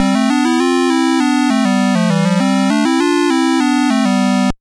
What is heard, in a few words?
Stage End; Donkey Kong Jr; Atari 7800